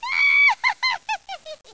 Steal; Bible Fun Pack; Education Publishing Concepts; NOAH

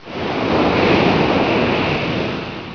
WAVES

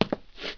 stone shuffle; Player; Heretic II Demo

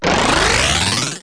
a zip glis, cartoon accents

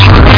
hit sound

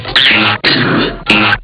tilt; 7invasores

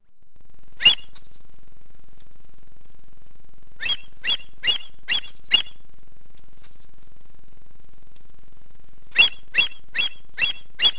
AVOCET; Webster's International Encyclopedia 1998

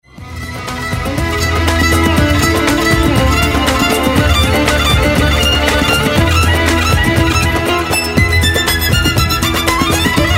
roll; The Sunday Times - The Month

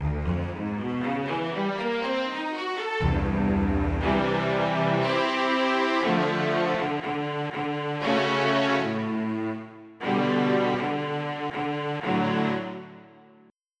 Chorus Strings; SoundFont - Traditional, Voice & Keys - Volume 2

